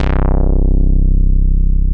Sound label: MOOG1 BASS C1